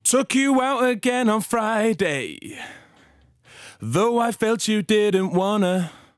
Vox Tonal Serial Comp In

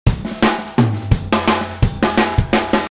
Drums Tempo Sliced

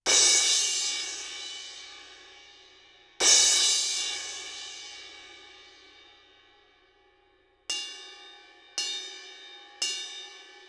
Cymb Crash; 120 Degrees From Vertical

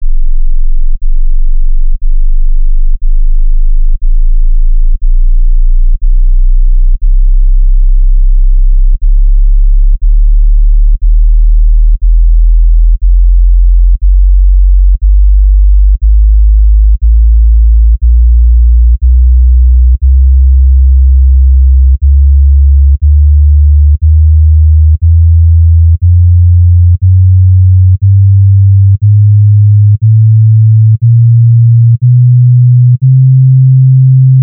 LF Sine Tones

